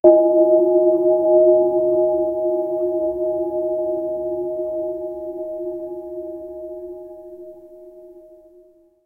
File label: a quick bell ding in